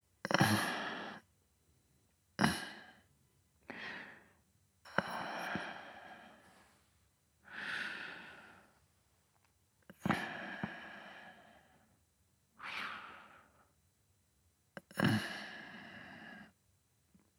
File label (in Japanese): 寝息; 寝息ボイス